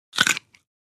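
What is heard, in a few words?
Single Short Bite Carrot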